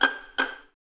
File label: Violins Pizz; String Ensembles; Miroslav Vitous; 11 Violins